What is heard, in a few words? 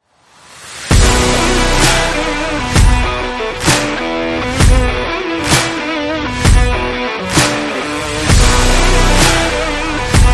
stomping rock four shots